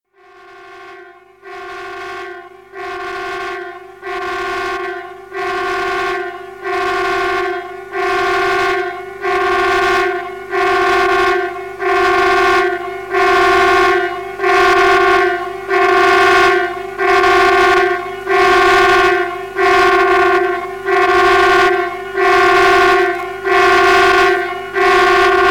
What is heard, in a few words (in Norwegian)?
Flyalarm parallelltoner; Ton inn